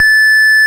Square A5; Ableton Foundation FreePacks; Foundation Glitch Toys & LoFi

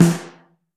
Snare Mono

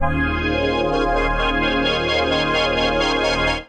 Pad (Eb) aftertouch 130bpm; Music Loops; Tropos Pad; Ableton; Factory Packs; Singularities

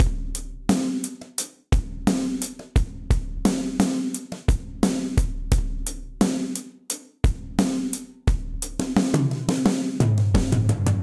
Fill Multirod Dry 97bpm, Session Drums; Fort Knox; Ableton; Factory Packs; Session Drums Studio

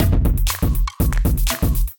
Kindified Kit, Drums; Kindified Kits

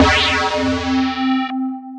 Amp, Synth Lead; Grans Amp; Ableton; Factory Packs; Punch and Tilt

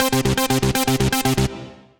Bassline; Synth Rhythmic

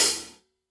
HIHAT side loose

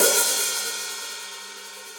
Hihat Open Ambient Loud Punch; Drum Hits; Standard Hits; Ableton; Factory Packs; Session Drums Studio

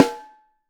Snare Top

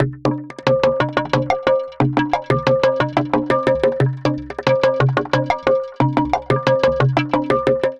Synth Pulses; Drums; Ableton; Factory Packs; Glitch and Wash

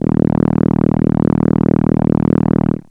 Solina Contrabass D#1; Analog Synths; Ableton Foundation FreePacks

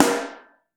SN3 Mono, snare

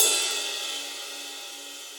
Ride Mid Deep Snare Dynamics; Drums; Drum Hits; Standard Hits; Ableton; Factory Packs; Session Drums Club

